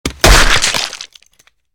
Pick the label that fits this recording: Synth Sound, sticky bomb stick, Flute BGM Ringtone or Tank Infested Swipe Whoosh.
sticky bomb stick